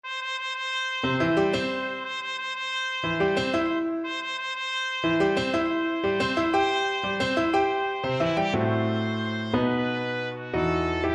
wedding march, trumpet; mendelssohn wedding march

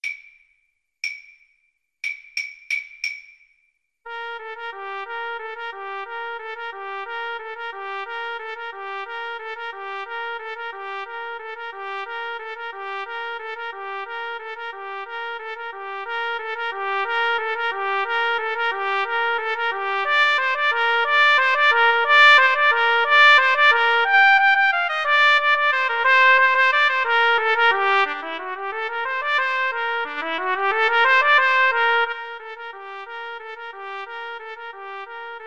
carol of the bells trumpet trombone Duet

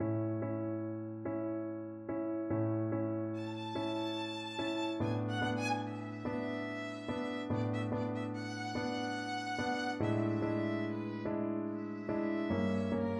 string quartet, violin; Borodin Notturno String Quartet

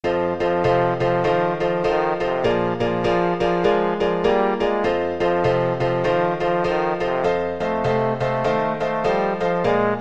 style, trombone

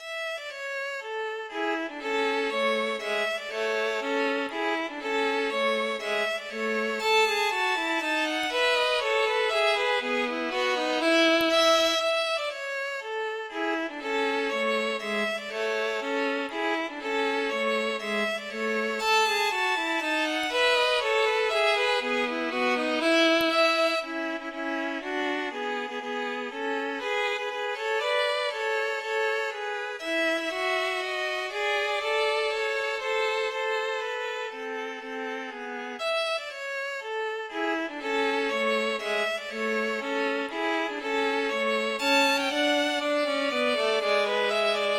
minuet, violin duet

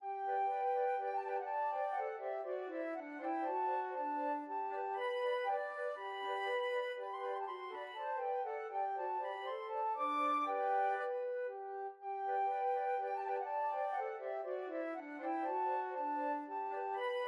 flute quartet; German Dance K605

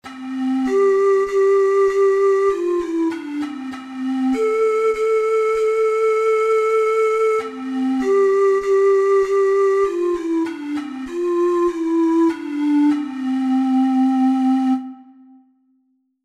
lavenders blue, ocarina six hole

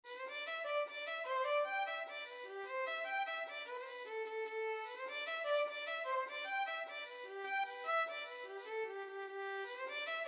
violin, Irish Trad

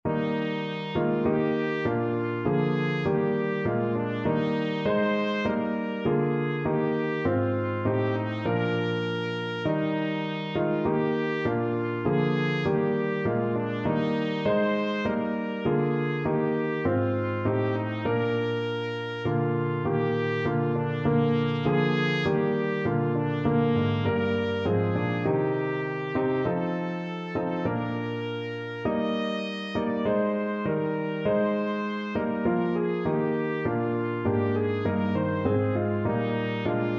glorious things of thee trumpet

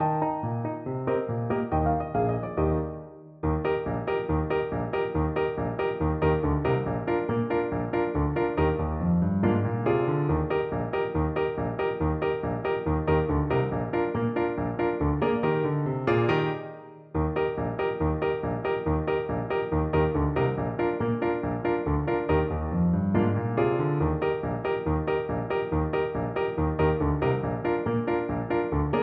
der shtiler bulgar FL kar, Flute

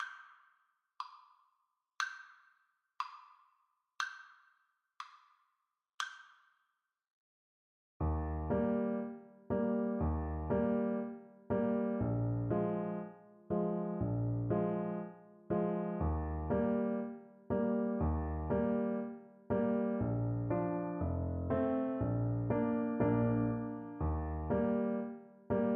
trumpet hornpipe

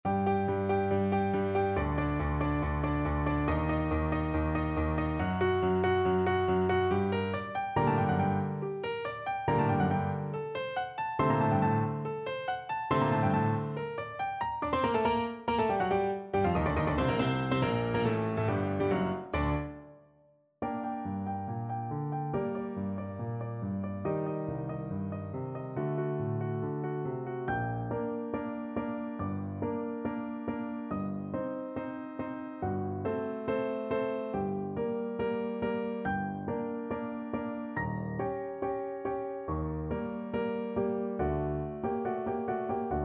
mozart, piano duet; mozart sym25

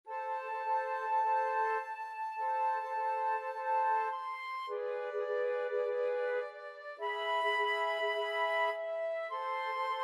flute trio

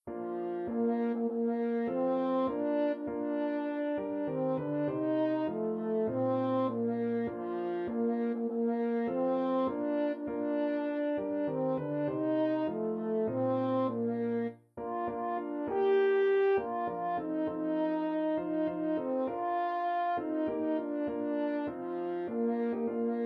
christmas tree, french horn

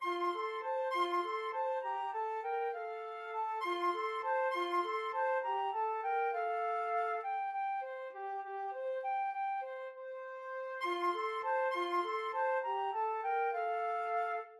moaner FL duet, flute duet

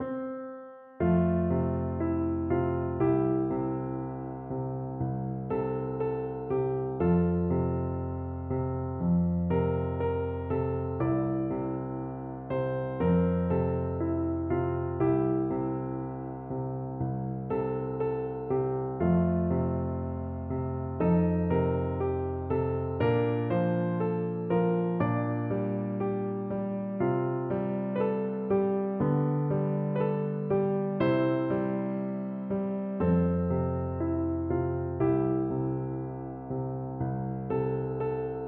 etude piano; chopin

